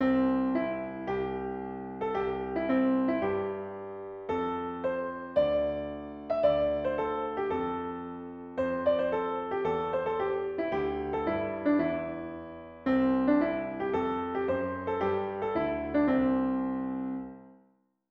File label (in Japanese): ピアノ